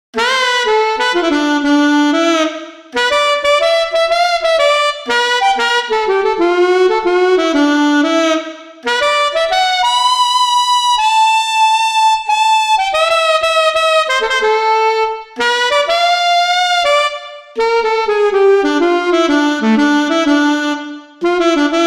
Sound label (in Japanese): Operator Sax Solo, サックス; ピッチベンドあり; サックスの打込み; masaakiwada; Seminar